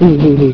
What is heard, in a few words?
laugh